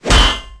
pipe impact; Tony Hawk's Project 8; XBOX

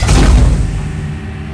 door cargo Bay start; doors elevators; Star Wars Obi-Wan; XBOX

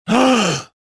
gasp; reborn

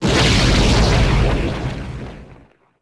explo gas; impacts explos

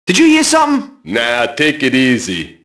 mobster group noise; enemy; Max Payne

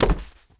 bodyfall marble; foley

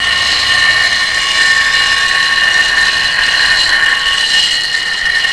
grind metal pole; terrains; Tony Hawk's Project 8; XBOX

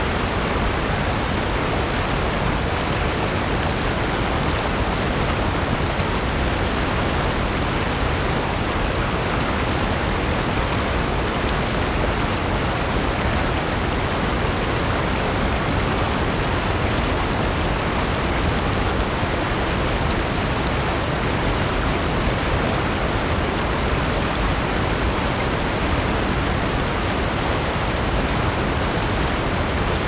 wtrfall top; Star Wars Knights Of The Old Republic I; XBOX

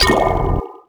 hint box; Tony Hawk's Project 8; XBOX

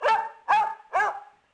Dog Bark; Project Gotham Racing 2

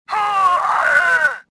choke; Star Wars Jedi Knight II Jedi Outcast; XBOX; shadow

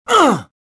pain

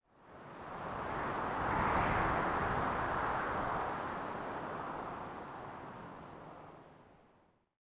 theed Wind Gust; Star Wars Obi Wan; XBOX

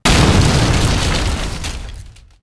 debris explode; weapons explosions

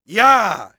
throw; UFC Tapout; XBOX

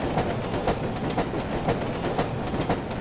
subway clicks

